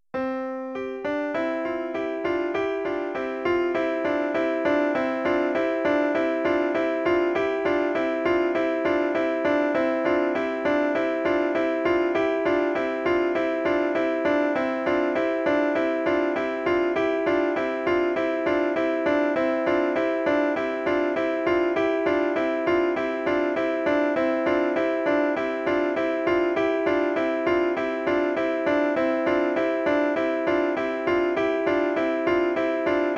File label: canon triasharmonica; js bach; bwv1072